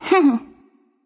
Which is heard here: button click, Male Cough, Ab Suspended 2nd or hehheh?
hehheh